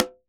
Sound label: Drums Percussion Congas